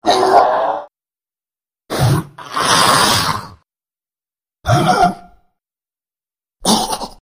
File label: Injured Zombie, monsters